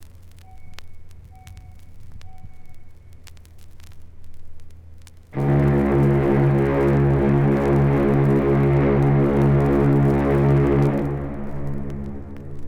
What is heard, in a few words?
Ocean Liner Whistle Blasts